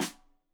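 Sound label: Snare Stereo, Drums; Deeply Sampled Drums; SMDrum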